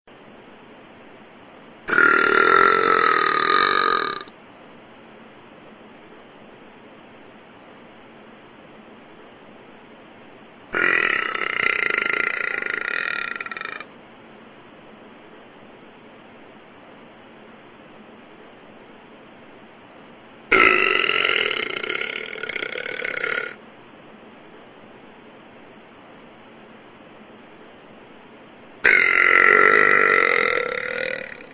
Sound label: Burping